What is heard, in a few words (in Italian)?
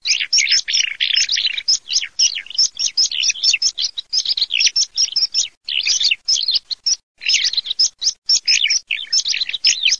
ALLODOLA PISPOLE